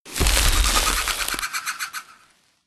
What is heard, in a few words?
spooky treatbag use